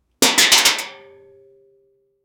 the sound of an old, falling objects

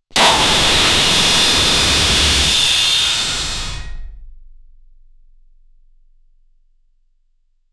metal deformation squeaky

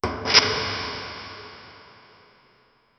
cold echo chamber

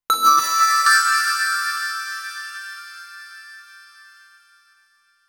menu confirm sound high, chiptune

